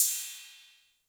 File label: Cym A 808 Tape Decay A; Cymbal; Clean